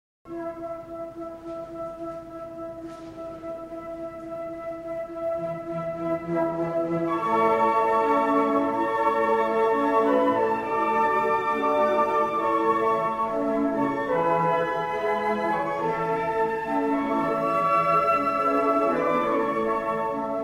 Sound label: concert, flute; 交響曲第9番「グレート」第3楽章; arietta-flute